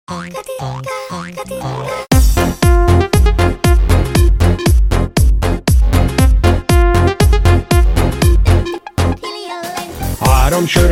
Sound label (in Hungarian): Pia Polka